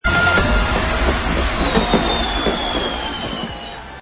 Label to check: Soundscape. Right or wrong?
Wrong